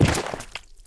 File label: Slug die